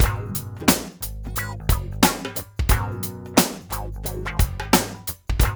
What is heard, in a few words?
mix, funky loops; East West; Funky Ass Loops